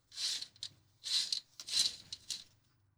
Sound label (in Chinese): 將液體流進耳朵深處做洗淨, ASMR; 桜木学園癒やし部～2年A組・佐々木絵空。文学少女の耳奥癒やし～; 耳かき・マッサージ